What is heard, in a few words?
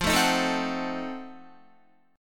F 7th Flat 9th F down Guitar; F7b9; strummed